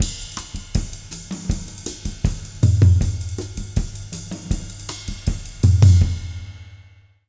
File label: 160, Jazz Latin Drumloops; USB Soundscan vol.08; AKAI